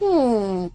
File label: hmmm; roblox